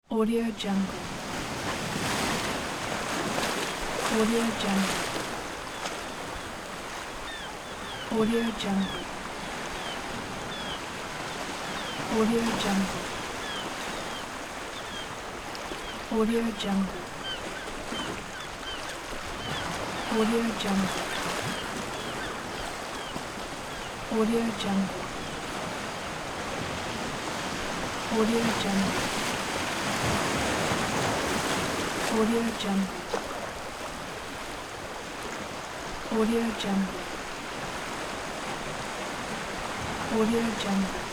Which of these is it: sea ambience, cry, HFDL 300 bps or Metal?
sea ambience